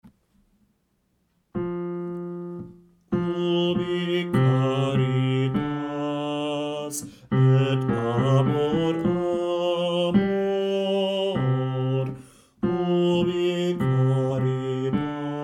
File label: Ubi caritas BAS